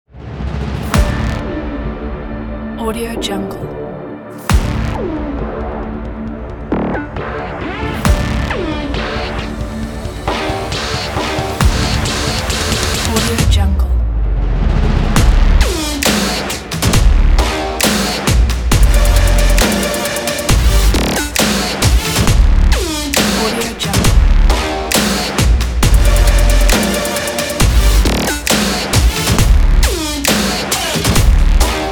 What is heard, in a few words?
aggressive power sport; ummbrella